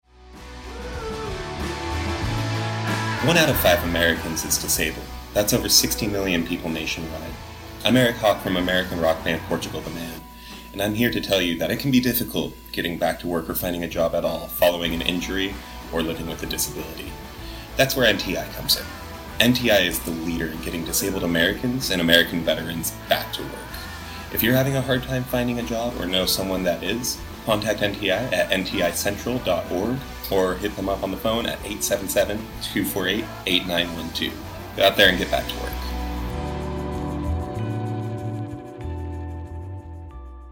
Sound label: Hip Hop Kids